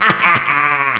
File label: LAUGH